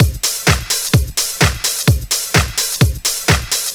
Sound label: House Beat, 128 BPM